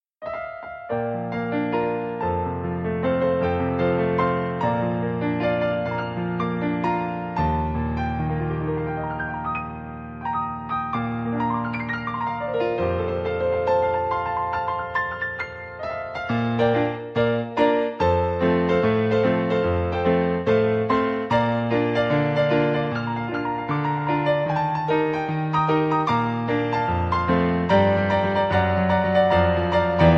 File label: Happy Birthday Song Piano Cover